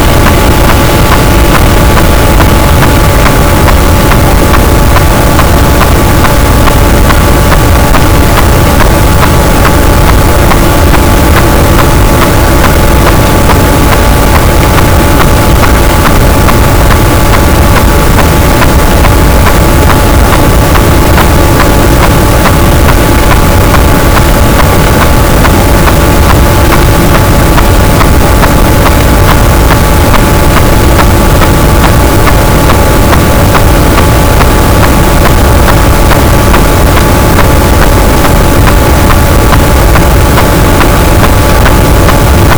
cachalot, gaussian noise; Physeter Macrocephalus

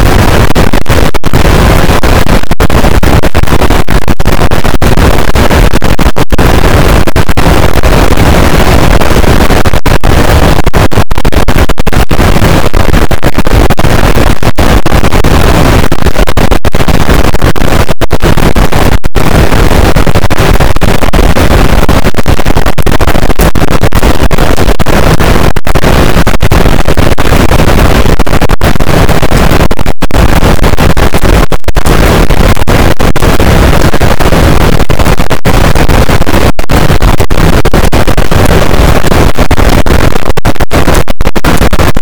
cachalot positif, boat; Physeter Macrocephalus

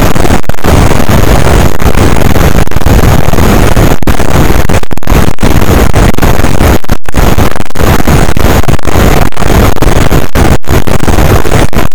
cachalot negatif, boat, Physeter Macrocephalus

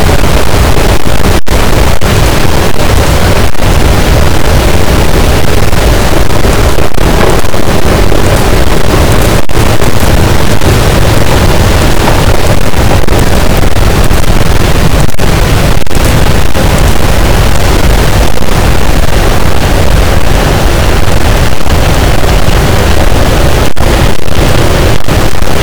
cachalot positif, boat, Physeter Macrocephalus

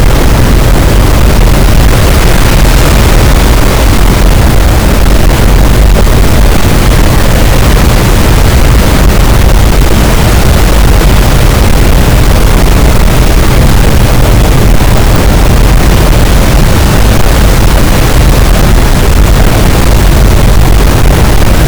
cachalot, gaussian noise; Physeter Macrocephalus

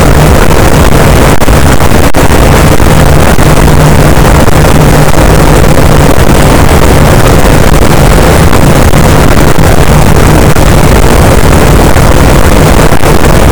cachalot positif, boat; Physeter Macrocephalus

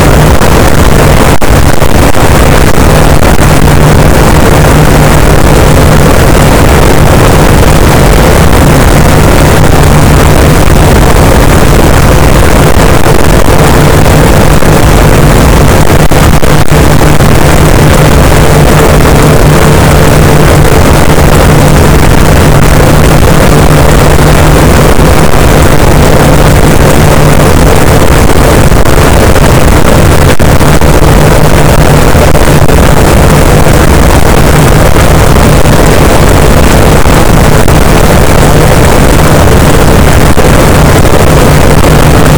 cachalot negatif, boat, Physeter Macrocephalus

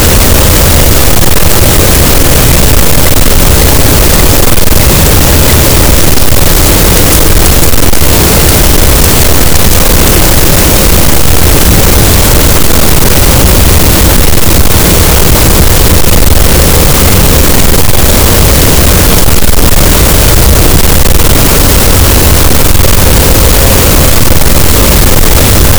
cachalot, Physeter Macrocephalus, gaussian noise

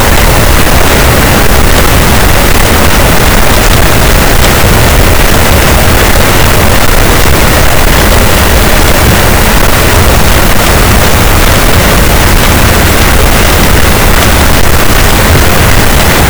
cachalot, gaussian noise; Physeter Macrocephalus

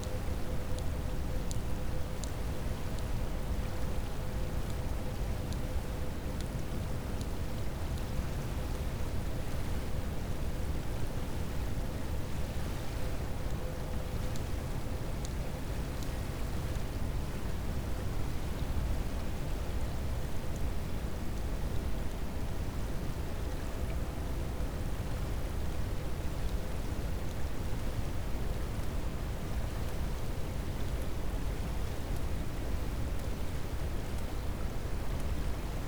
cachalot positif, Physeter Macrocephalus

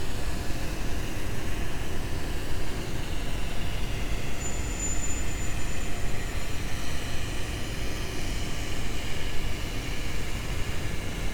cachalot negatif, Physeter Macrocephalus

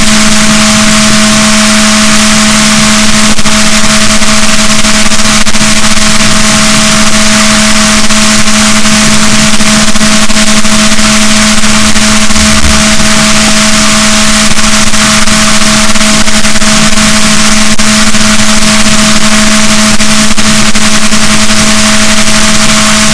rorquals positif, BalaenopteraPhysalus, boat; SNR 12dB

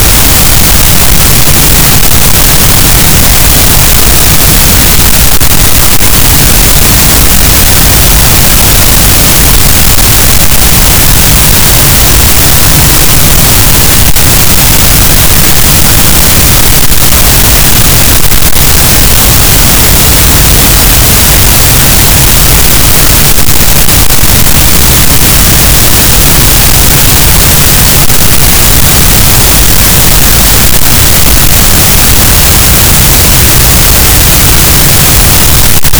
cachalot, Physeter Macrocephalus, gaussian noise

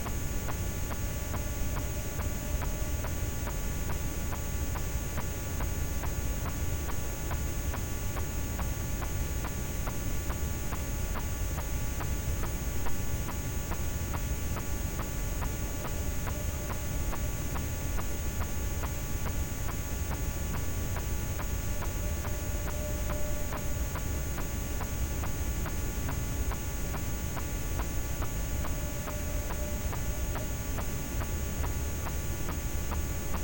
cachalot negatif, Physeter Macrocephalus

